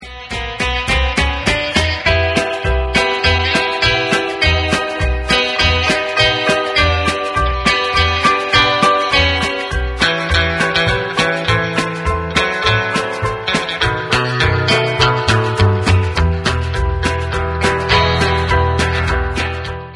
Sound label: Clink Clink Classic